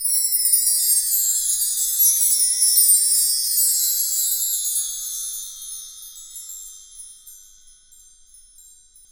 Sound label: Wind Chimes; Roland LCDP03 Orchestral Perc